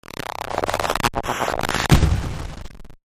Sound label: LoFi Rise Hit